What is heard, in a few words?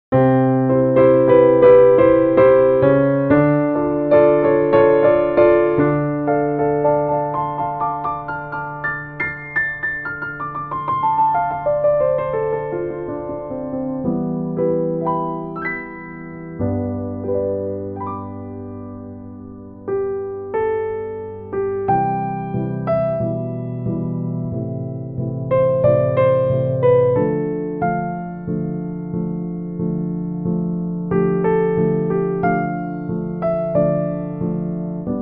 background music, Wedding Invitation